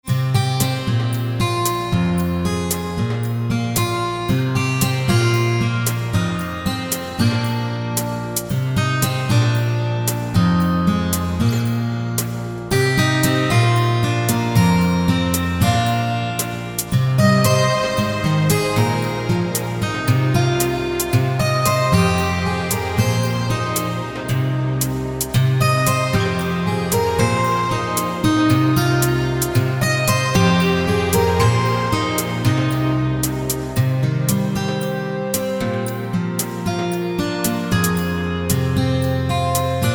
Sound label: Romantic Guitar